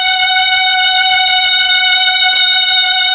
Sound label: Mellotron F#4, STRING; Best Service Gigapack 1